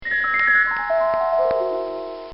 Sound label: Harp Run; Harp Sound Effects; Musical; recorded in a custom built sound booth